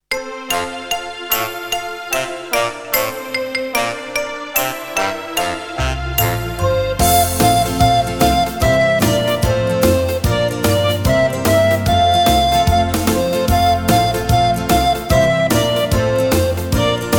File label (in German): Auf unsrer Wiese gehet was Listening, recorder